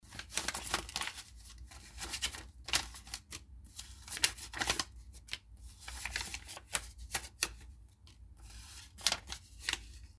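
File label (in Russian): шелест одной купюры в руках; Звук купюр, долларов; Звук купюр, долларов, отдельно и в пачках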